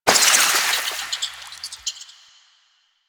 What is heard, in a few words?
Infested Flower Burst; Ambience; Props; Warframe; NineteenNinetyNine